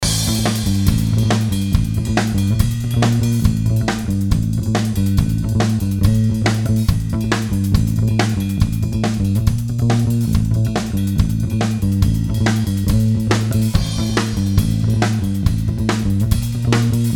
BEAT LATIN, Beat Loop Latin Drum Bass Groove Up Tempo